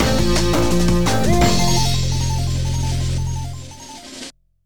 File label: jingle; starvelous squad